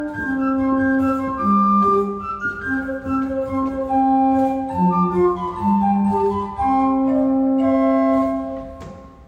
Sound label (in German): Floete 4, Orgel; Dettenschwang; Renovierung